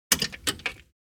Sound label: Motorcycle keys and ignition locking; vehicle